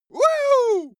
male cheer victory woohoo; schreie; menschen

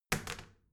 toilet seat lid wall open soft; haus-garten; badezimmer

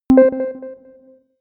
notification bleep; signale

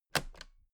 toolbox latches close heavy double; industrie wirtschaft; handwerk

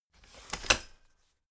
folding chair unfold medium; objekte; stuhl

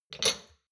shot glasses grab multiple soft, gastronomie; berufe; soundpool

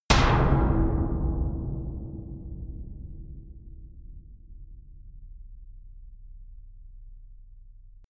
sub metal impact bright

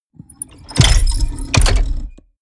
mechanics large lock squeak; industrie-wirtschaft; mechanik

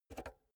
pull out xlr multi socket soft; objekte; elektronik

